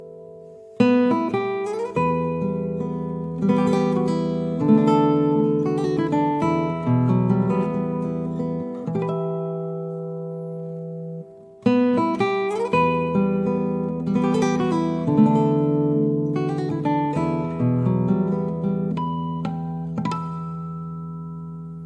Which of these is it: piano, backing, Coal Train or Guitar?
Guitar